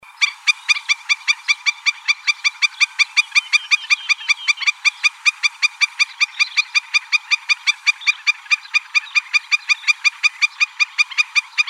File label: Shorebirds; AZFO; deviche